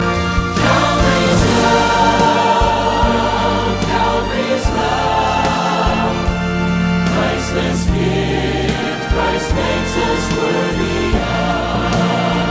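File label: cavalry charge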